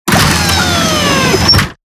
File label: Robotic Movement; SoundsCrate